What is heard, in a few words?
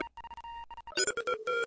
short morse, filtered morsecode like